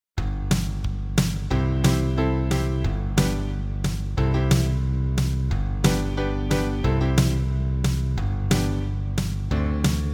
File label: Vocals